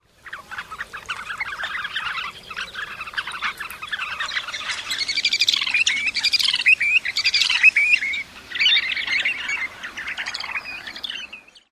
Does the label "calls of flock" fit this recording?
Yes